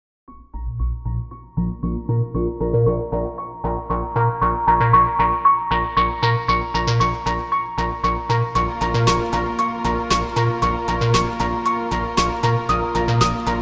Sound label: HipHop